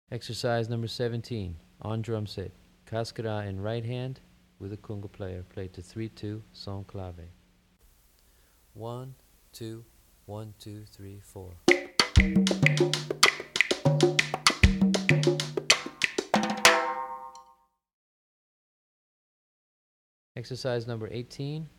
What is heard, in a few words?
Bombo Note